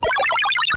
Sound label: boot; toystory; woody